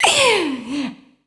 Dance Vocals, FEMALE

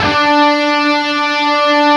LEAD D, Guitar; East West; Steve Stevens Guitar Samples Collection

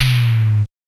electro Fizz, tom; Polyend; Legowelt

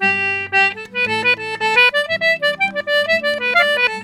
G C5, Celtic Flavours; USB Soundscan vol.42; AKAI

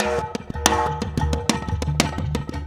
Snare Particle, Processed Drumkits; Zero Gravity Beats

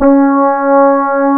C#, Synclav FM, BRASS; Big Fish Audio

